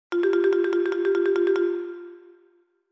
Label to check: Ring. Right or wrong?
Right